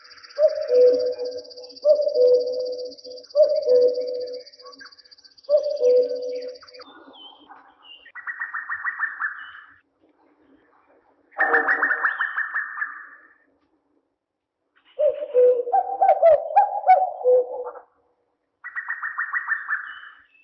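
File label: obyknovennaya kukushka golos, common cuckoo voice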